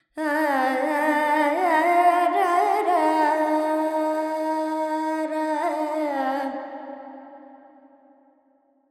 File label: Carnatic Female Alaps, Lydian, 70 G, Verb; Vocals; Sample Banks